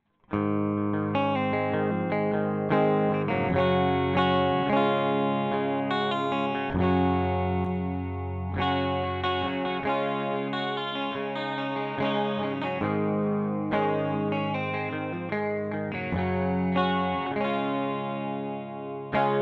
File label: Unison; Binaura